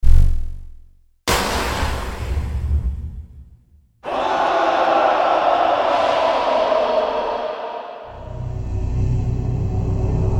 Atmospheric

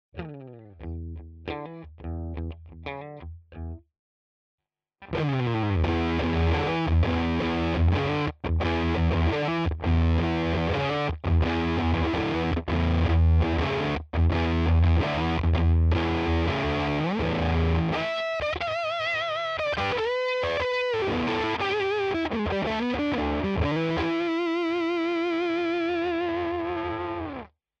Riff F half pre full honey; Octa Hive white

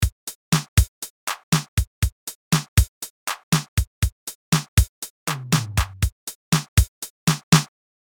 TR 707; Roland Cloud

